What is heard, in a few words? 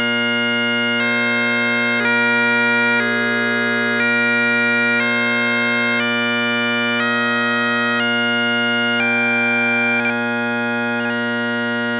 harmonic, pipes acoustics